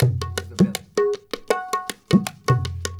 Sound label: INDIA L, Heart of Asia, IND ENS